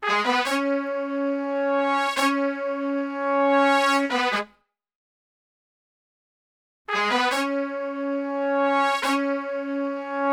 ROCK C#M, Brass; Best Service; Brass Super Section; ROCK LICK

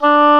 OBOE D3

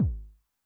BD High Tune Long Decay, 909mod, BASS DRUM; classic machines

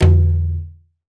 Madal Da, Percussion; Roland BK-5

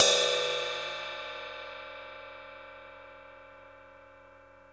Drum Dry; East Collexion; Drum 1

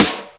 Roland R-8 Snare, Drums

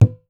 IYA LW D, BATA; Voice Crystal - Steve Reid Definitive Percussion

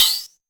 TR909 OHH; Roland SH-32; Drumset 04

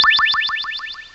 SYN DRIPS; CMI Sound Library Rev 1.3

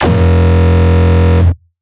crushed vocodex bass

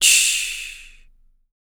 CYMBAL, MALE PERC

